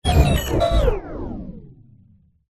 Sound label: science fiction robot failure glitch shutdown